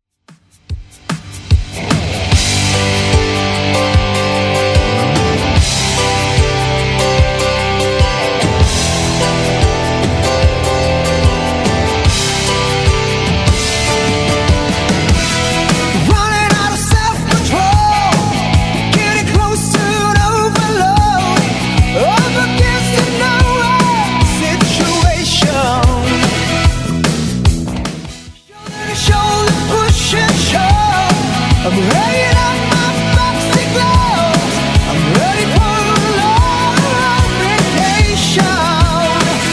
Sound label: 80s Rock Redrum 148bpm